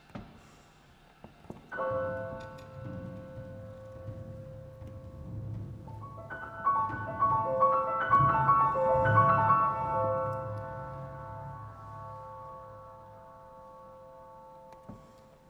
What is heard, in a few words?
Piano